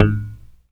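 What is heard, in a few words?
fretless bass mute G; AKAI S-Series CD-ROM Sound Library VOL-2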